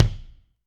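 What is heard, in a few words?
Kick Ambient Deep, Alesis D4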